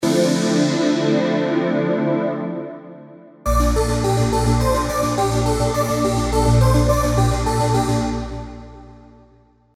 Halo Effect, Spire; alonso happy holidays 2021 spire soundset

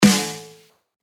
Snare; Overall; revealed claps snares vol 2

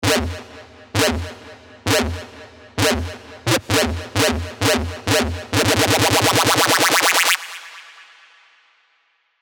LD Whomp, Sylenth1; alonso 19 year anniversary pack

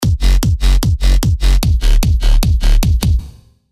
Kick & Bass; hardstyle; revealed serum hardstyle vol 2

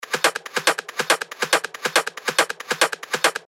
techno loop 140 BPM; Harvest; revealed techno top loops vol 8

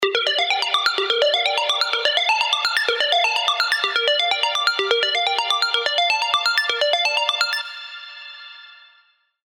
Atlantic Swell, serum arps; revealed serum arps vol 1

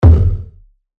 Tom, percussion; revealed percussion vol 3

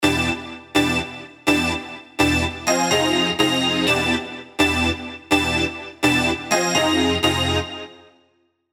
serum 2 house stabs; Sinmulation; alonso saloon serum 2 house stabs

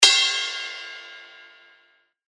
Ride, cymbals; revealed cymbals vol 1

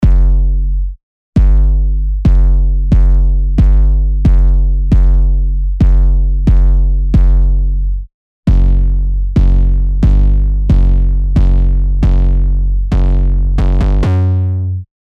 808, ONLY BASS; revealed serum 2 808 vol 1

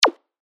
PERC Jack, percussion; revealed percussion vol 3

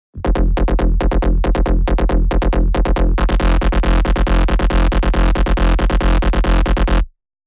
Grunt; revealed spire rolling bass vol 2

